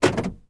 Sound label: transport car peugeot 307 electric central locking lock internal